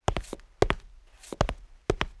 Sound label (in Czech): kroky; Mafie 1